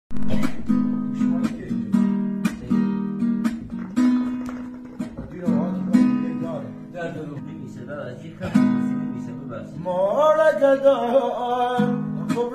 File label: live guitar